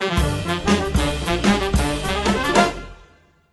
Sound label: win